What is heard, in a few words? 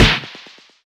high kick toe hit; HeavenStudio; Space Soccer